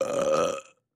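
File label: Medium Cute Belch; belching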